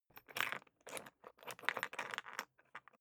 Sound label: briefcase plastic latch lock key turn ringing; industrie wirtschaft; bueroarbeit